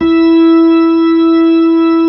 B-3, Keyboards

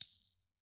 MARACAS; EdgeSounds; Drum Mashines VOL-1

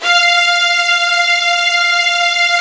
F 4 violin; VIOLIN1

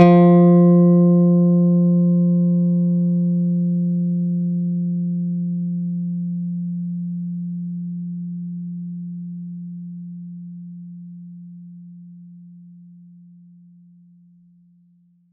Electric Bass, F3; Polyend; Simulacrum Sounds; 1 Shots; Acoustic and Electric

